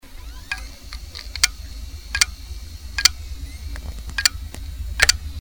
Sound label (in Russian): Stuk vinchesterov WD vyzvannyy neispravnostyu bloka magnitnyh golovok